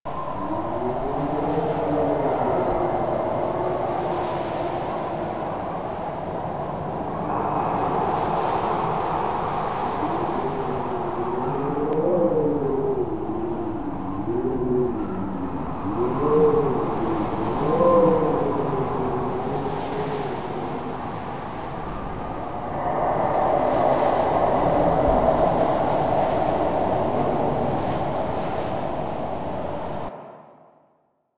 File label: Exterior Foreground Desert Sad Scared Loop; Ambience; Duviri; Warframe